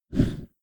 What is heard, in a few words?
A Zombie Trying To Grab You